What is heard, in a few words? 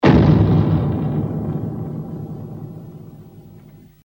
footstep